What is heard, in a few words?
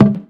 Bongo